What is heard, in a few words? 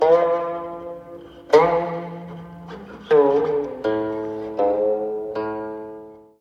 Guqin